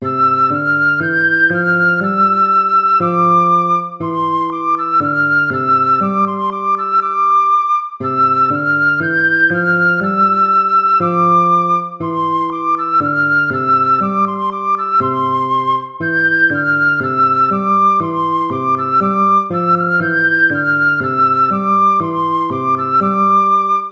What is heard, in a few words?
gavotta; hook james